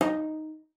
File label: D#4, CELLO FINGER; Xsample VOL-5